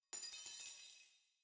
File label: tools